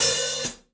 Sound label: Gretsch Hi-Hat Closed; Sonic Foundry (Sony Creative Software); Vital Drums